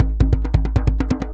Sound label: DJEMBE GROOV; Best Service; World Colours